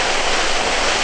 SKID MUDD

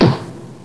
wood; PC Gamer IT CD 38; thief

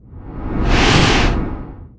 explosive charge; Earth and Beyond; EA Games

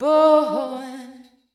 VOICE GRL HOUSE 125, House Voice

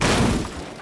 wreck; PC Gamer 4.5

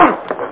uppercut

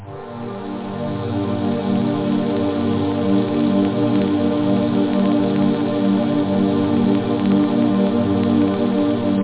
atmo, synth chords; da capo vol1

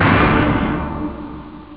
orch

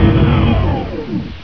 DOOR; Meteor

